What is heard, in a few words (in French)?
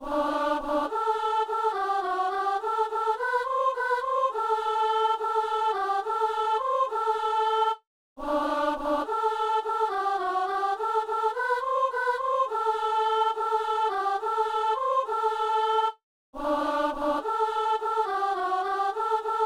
soliste et soprano; Acclamation de l'Evangile